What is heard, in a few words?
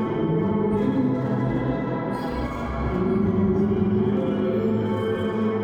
Tonal Re Draw, sparse soundscape